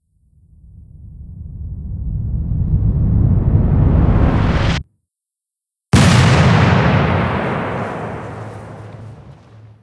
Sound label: raketa fail